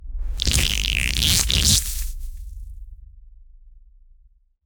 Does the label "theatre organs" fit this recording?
No